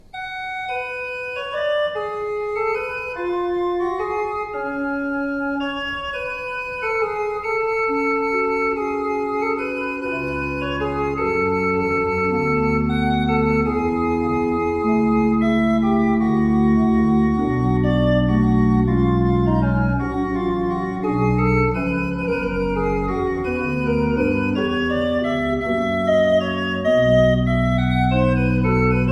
Fuehrer, gebrauchtorgel

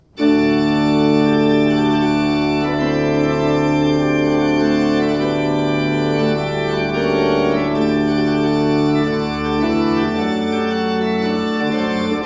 Fuehrer, gebrauchtorgel

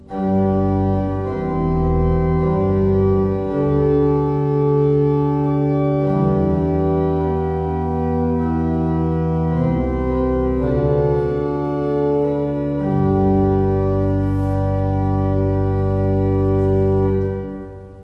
Walcker, gebrauchtorgel

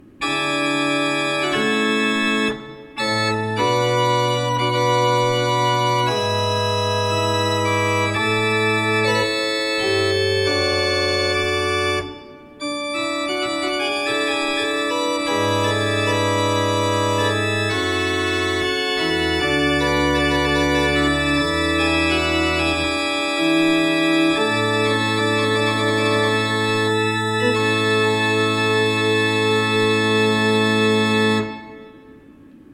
Offner 4 I, orgel

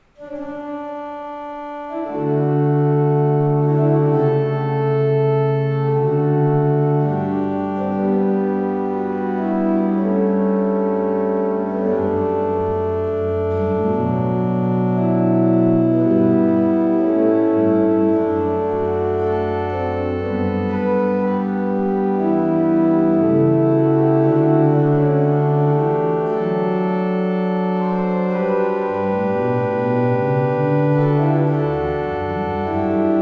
Hammer 22 II P, orgel

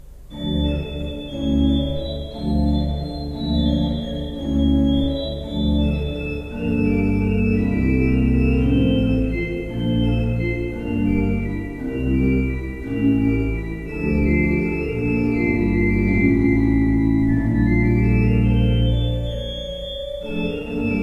Breil, gebrauchtorgel

